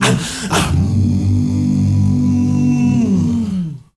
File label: MALE HUM, M. HUMMERS